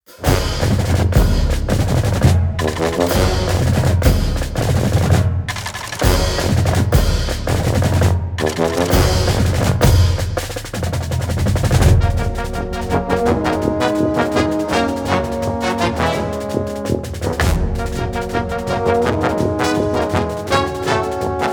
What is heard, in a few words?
Band Performance